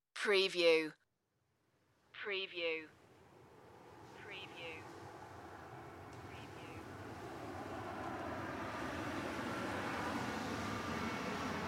airplane flyover

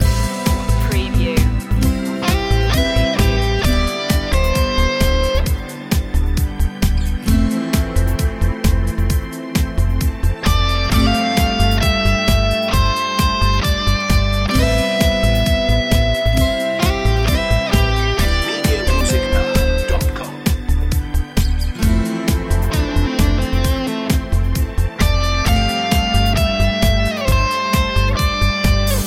ambition score loop

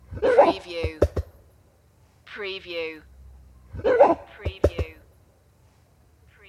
Gorilla Bark Pound Chest

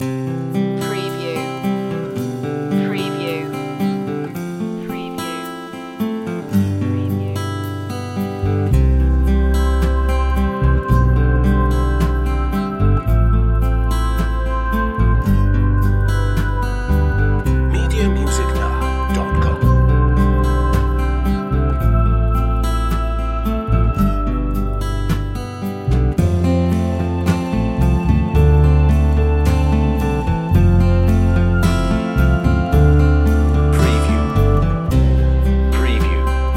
acoustic ocean

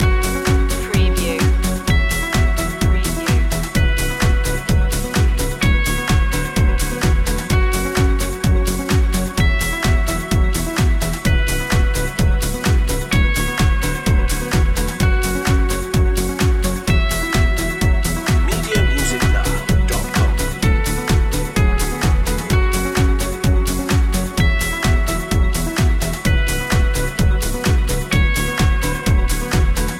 time piece loop

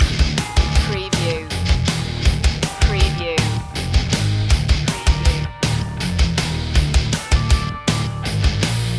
firestorm loop

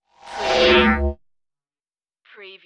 drone slide beam

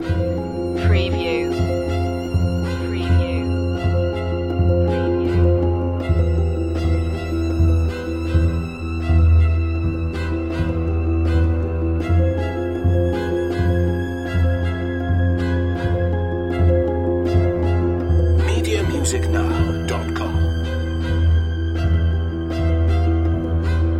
creepy strings loop

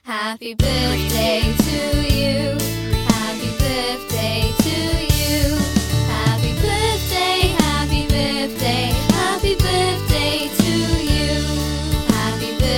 happy birthday to you children vocals short

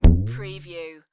Rubber Band Stretch; sound effects bible

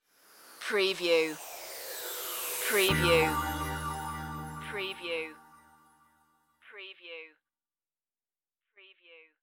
beach side stinger

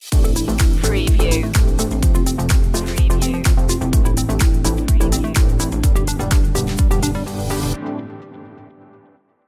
positive direction stinger

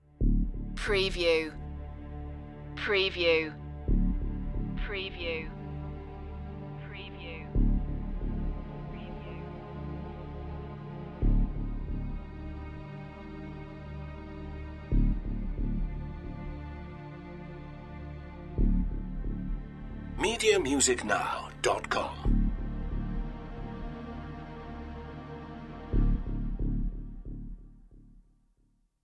pensive

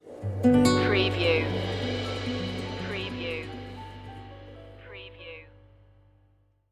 latino stinger

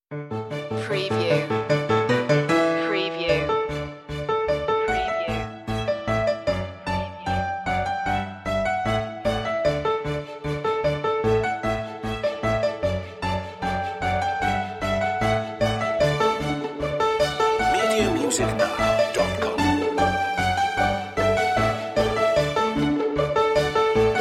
no woodwinds

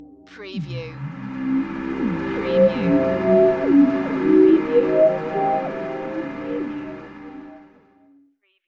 Scifi Audio Logo Ident, Foggy, Movements, Fantasy

